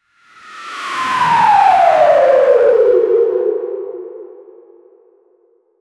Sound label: create whoosh with f mino